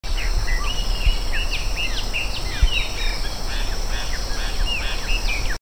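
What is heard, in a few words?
Gnorimopsar chopi chorus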